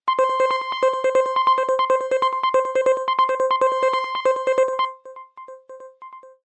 Single Tone; LG LX290

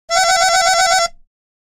Nokia alerts; Windows Phone apps; Clari

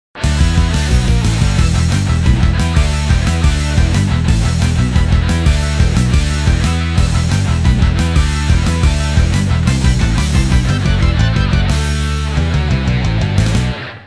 Hard rock videoringtone; Nokia; Audio themes

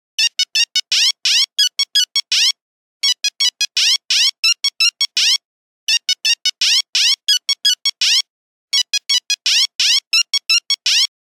Caprice, Nokia Buzzer; Windows Phone apps

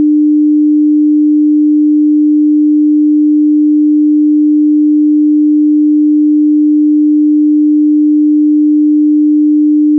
300Hz Stimulus Mono; Apple iOS-18.1; 4-5dBFS; 16bits; 10sec; 48kHz

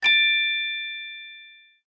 grand opening, notifications; Google; Google Sounds; Play It Loud

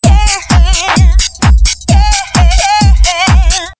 Tec House; Philips; Multimedia Studio

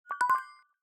Wireless Charger Drop; Microsoft; Windows Phone; DeviceHub Gadgets 3.7

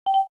Video Stop Record; ZTE nubia N1

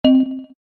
electric, keytone

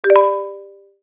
haptic unlock success; Sharp; HAPTIC SEC UNLOCK SUCCESS; AQUOS sense lite SH-M05

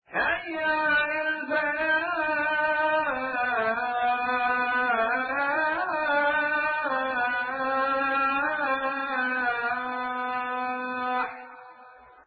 azan; Spreadtrum

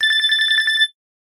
Simple Beep

